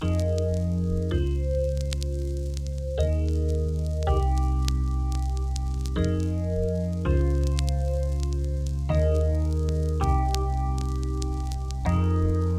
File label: Full Chords, 81 bpm; hiphop; StudioLinkedVST Vinyl Touch; Progression 15